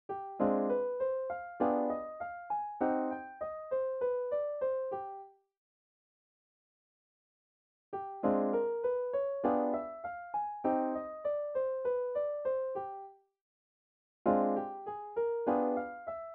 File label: minor ii-V lines